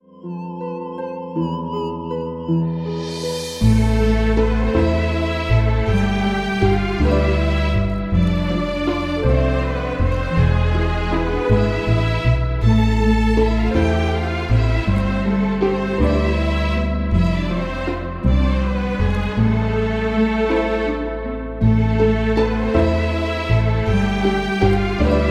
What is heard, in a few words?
Fantasy, RINGTONE; MIUI12